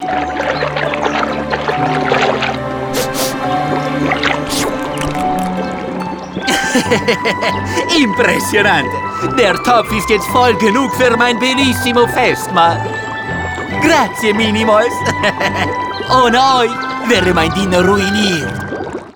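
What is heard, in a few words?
Outro Victory German; arthur and the revenge of maltazard; wii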